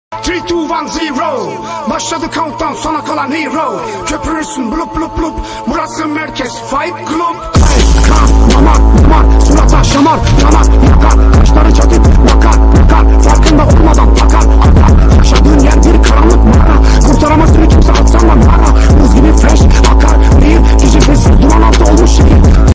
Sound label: bass